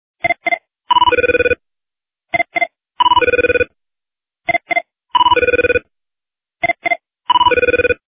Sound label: ring tone